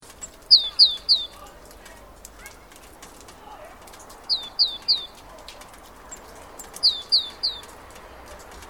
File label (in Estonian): Rasvatihane haalitsus; summer